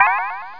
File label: Teleport